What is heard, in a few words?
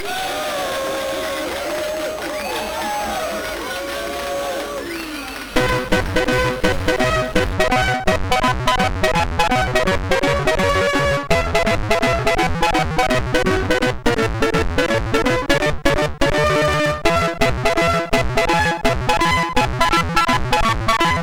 sid MOS6581R4; Lemmings end screen